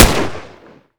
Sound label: fire, weapons, m4; garrysmod; cod4